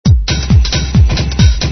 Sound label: house